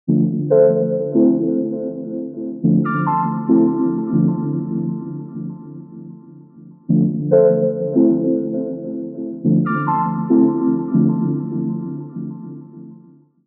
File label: Bpm141 Stab Loop Dm; Airy; ForestDub; Prime Loops; Dubstep Illusions